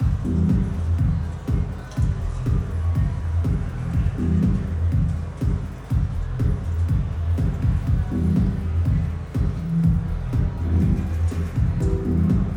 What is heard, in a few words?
ambience General; netent